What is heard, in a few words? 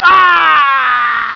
death; cstrike